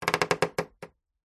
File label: household door wooden creak hinge short